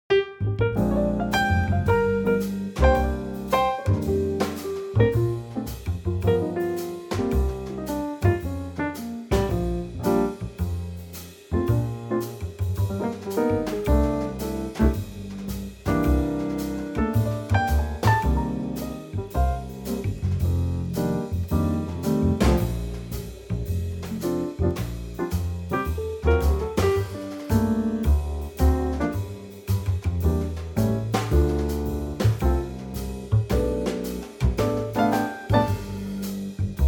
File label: Bb Trio